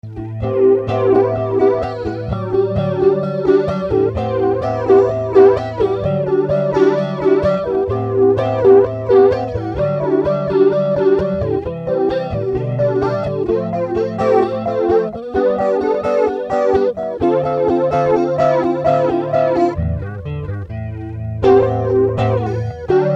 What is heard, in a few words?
Ultra Flanger